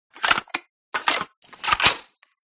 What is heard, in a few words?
ak47 reload, guns